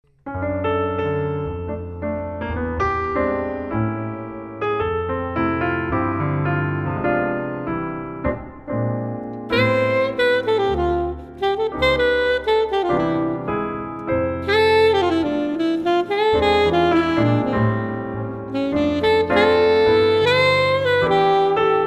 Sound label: Sax; Lesser-Known Gems